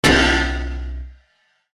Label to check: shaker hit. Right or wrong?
Wrong